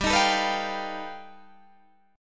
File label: G Augmented 9th, Guitar, Open D